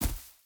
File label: footstep boots grass jump land; Rust